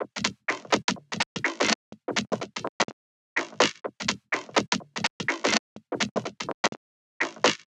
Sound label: Syn Fx Loop 125; Equipped Music